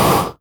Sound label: Flame Thrower; Angry Goy II; SoundEffects